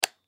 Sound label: Black Light Switch; SoundEffects-Blastwave